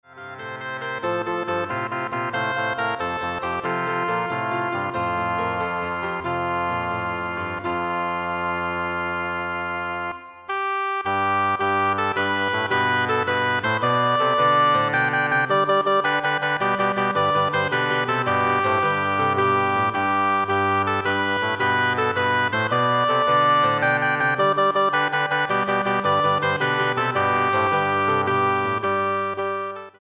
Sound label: Oboe Bassoon